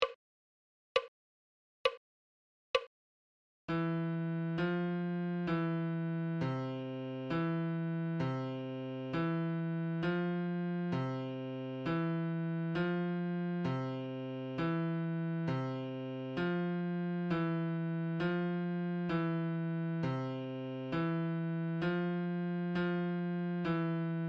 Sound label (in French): Clé de Fa, Adagio